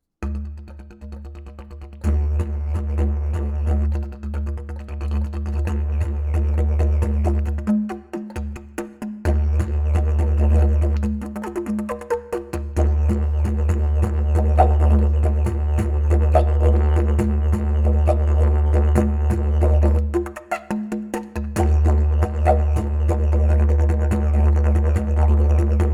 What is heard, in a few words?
didge